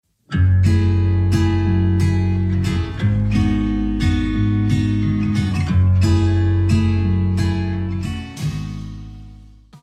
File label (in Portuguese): violão